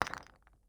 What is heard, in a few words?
ui drop bone; Rust